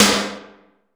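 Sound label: CAVE snare drum, effected drum; Crypton - DrumBase5000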